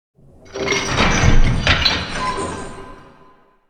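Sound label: big chains sweetener close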